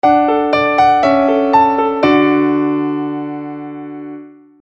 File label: nice melody kurz piano